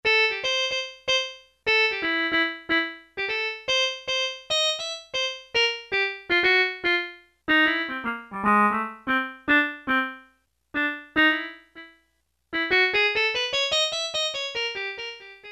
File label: MUTE TRUMPET, Yamaha PSR-300; Voices